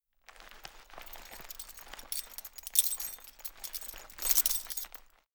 Coins in Leather Bag Movement; Fairlight Sound Lib

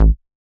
Bass A

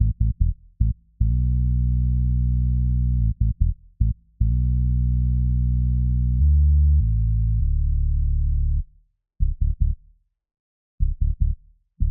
75 BPM A Min Bass; Melody Loops; Nationals; Retro Collection; Cymatics; ANALOG EVOLUTION Beta

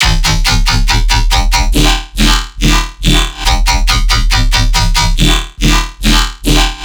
Riddim Bass Loop - E 140; Double Design; Ghosthack; Riddim Essentials Volume 2

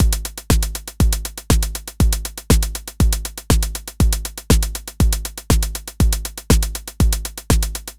Loop Drums 120; Deep House; Ol' Skul; Ghosthack; Advent Calendar 2019

